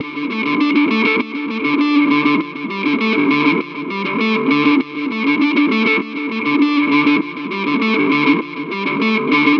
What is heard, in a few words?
100 D Gtr Duck N Cover; Zero-G; Future Wave